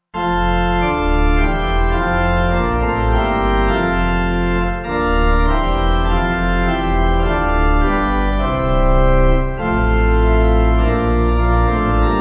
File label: Pipe; Branksome